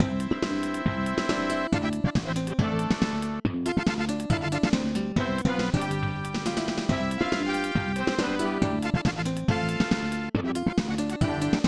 Paddock; Super Keiba 2; SNES

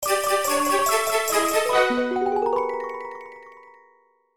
Level Cleared - Christmas; block breaker deluxe collection; Java

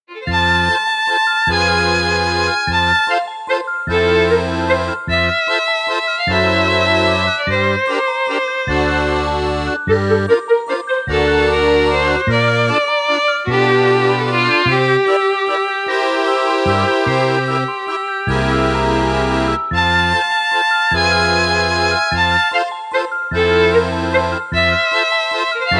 Valse; CrossCode; Switch PS4 Xbox One PC macOS Xbox Series X Linux

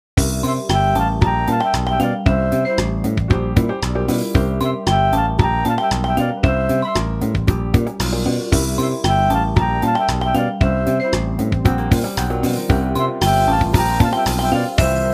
Music Menu event

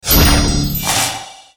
Spell Summon portal

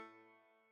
Bagpipe - Interlude

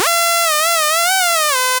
Beat

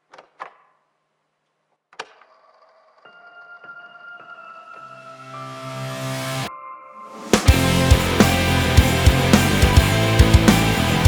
Twinkle, Twinkle, Little Star Rock; Rocksmith

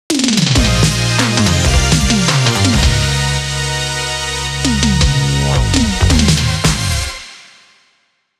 Stage Clear; Knocked Off

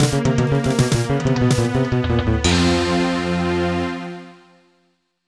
Boss Lose; Mario vs. Donkey Kong: Minis March Again; NDS

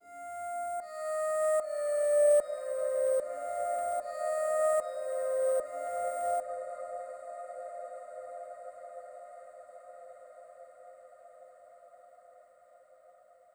Overlay Tone

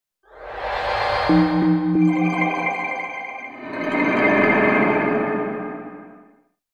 Exit Time Portal; the legend of zelda skyward sword expanded

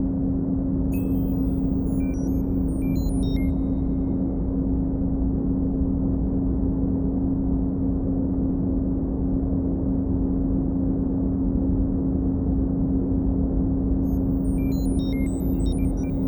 options ambience; starfence heroic edition

